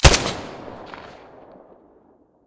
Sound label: silencer glock17 stereo